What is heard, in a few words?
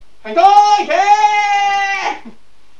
fight